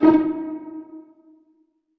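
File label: string pizzicato D#3 mezzo forte left; Sound On Sound - Peter Siedleczek's Orchestra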